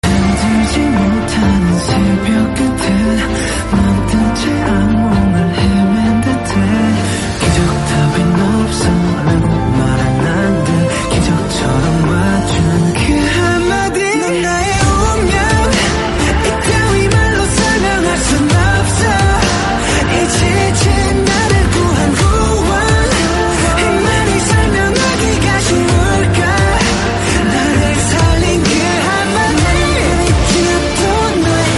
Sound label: korean song